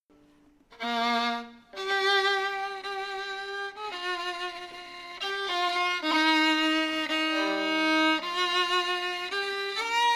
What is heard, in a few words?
scottish slow air fiddle